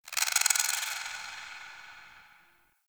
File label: Ext Creature Distant High; Misc Outdoor